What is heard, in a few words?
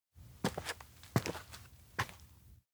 steps outdoor town stop; anemoi